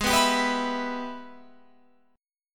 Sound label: Ab Minor Major 7th Sharp 5th chord, Guitar, Open F tuning; strummed; 20,18,19,18,19,18; down